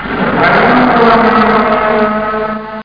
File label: wale